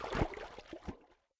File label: Underwater - Swimming; RadwinIsland